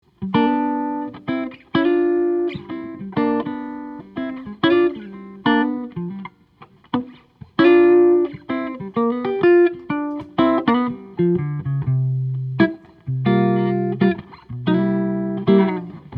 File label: amp, guitars, archtop guitars, electric; maple spruce; Michael Lewis San Rafael